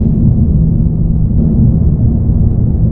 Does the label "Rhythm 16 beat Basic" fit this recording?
No